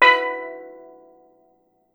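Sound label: STEEL DRUM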